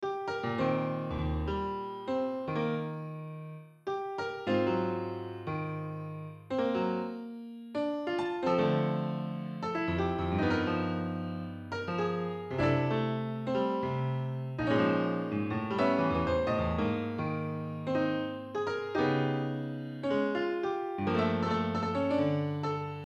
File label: synth Trans